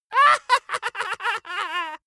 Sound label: dwarf laugh gleeful